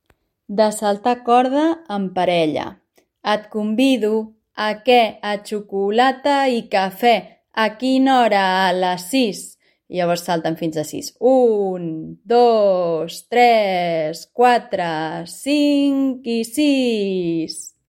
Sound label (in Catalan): saltar corda parelles; Et convido; jocs i cançons de carrer